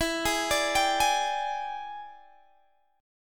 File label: E 7th Sharp 9th Guitar Open G, chord